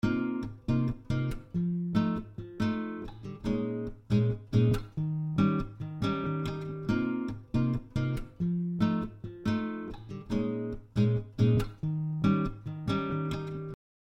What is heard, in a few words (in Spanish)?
guitarra clasica; ecoescuela